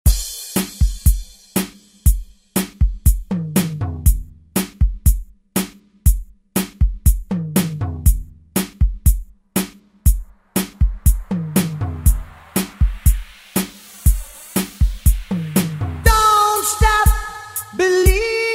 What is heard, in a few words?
ReDrum; DJ Mhark